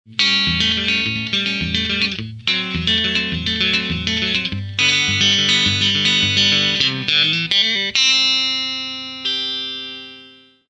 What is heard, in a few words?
middle clean; texas loco; ainsley stones; axetec